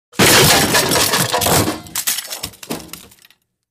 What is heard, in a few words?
Car Crash Small Metal